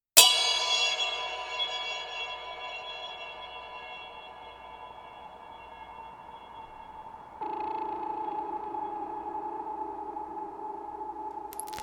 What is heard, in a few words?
Death Ambient